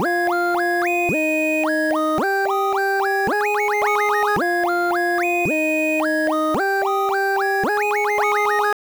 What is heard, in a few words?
8bit